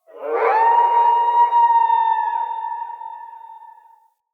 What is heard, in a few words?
Wolf Cub Trapped Call Distant